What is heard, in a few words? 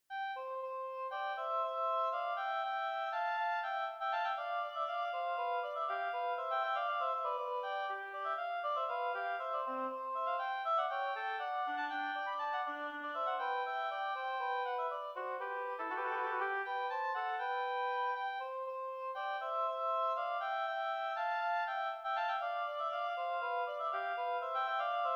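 duet 2 oboes; molter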